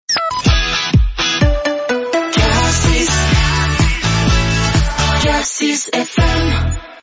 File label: jingle; k6fm